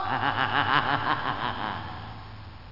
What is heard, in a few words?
hehe